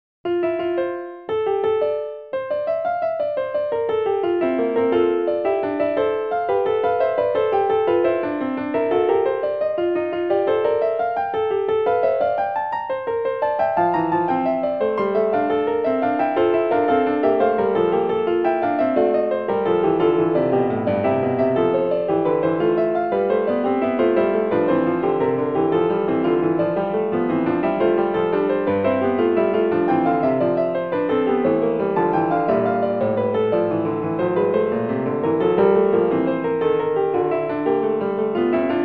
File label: piano; F Fugue bk2